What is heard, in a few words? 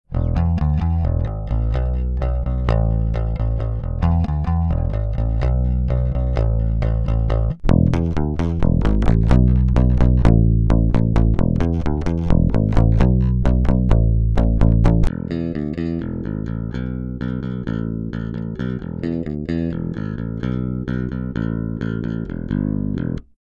DI Rock